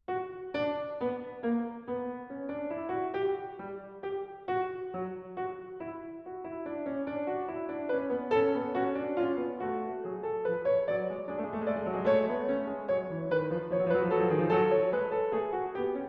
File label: Prelude & Fugue no. 24 In B Minor, BWV 893 - Fuga; Bach